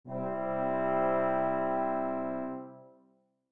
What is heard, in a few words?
4-part brass; Nikolai Rimsky Korsakov